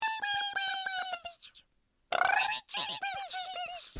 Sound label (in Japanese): オオルリのさえずり